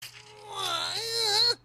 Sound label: whine; JSE